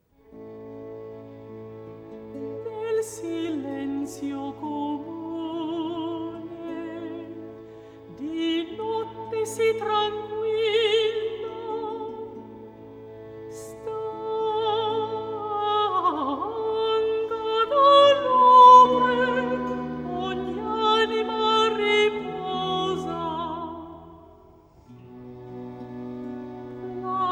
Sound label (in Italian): Recitativo accompagnato; Nel silenzio comune; Scarlatti; Cantatas